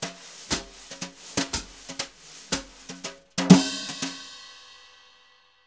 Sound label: BRUSH, Jazzistic; Univers Sons